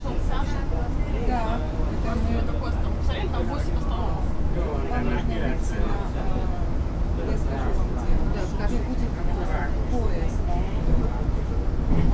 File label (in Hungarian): beszélgető utasok, elhaladó hangosabb jármű, busz távolsági; Dömös